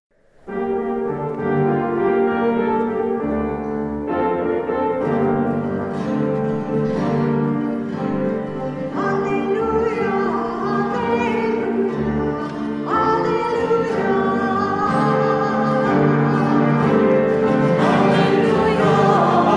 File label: Easter Alleluia